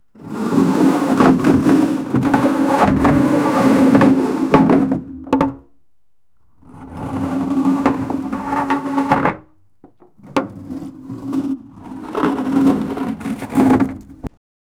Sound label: to to drag a heavy, dragging